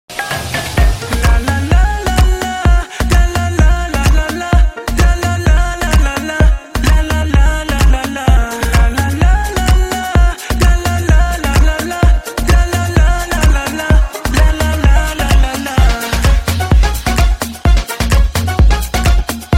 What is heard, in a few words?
base drums; alexunder; lys